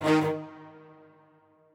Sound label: strings